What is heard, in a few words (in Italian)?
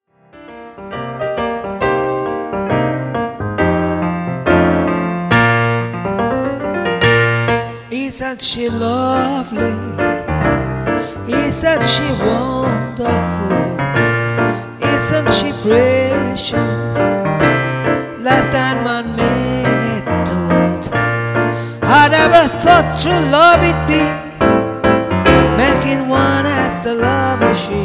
piano bar